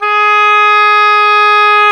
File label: oboe; Roland LCDP04 Orchestral Winds; Oboe Brth 1